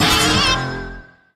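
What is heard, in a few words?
metal shield; freedom planet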